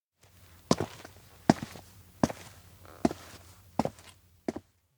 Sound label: steps indoor walk; meguriai; anemoi パッケージ版 1.1